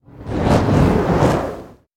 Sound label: Torch Throw Fireball